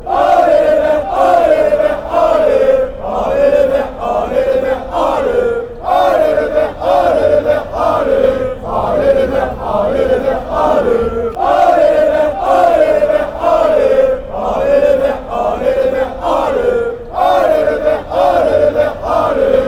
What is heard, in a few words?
come greens come on, chants; Association Sportive de Saint-Étienne Loire